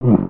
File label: Pet Bison Grunt; creatures pets